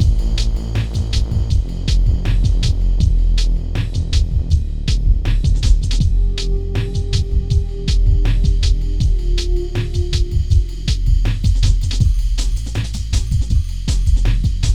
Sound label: Music Boss; Game rip; MiSide